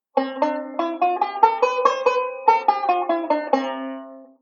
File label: Delay Scale; Beyond Chord Melody Volume II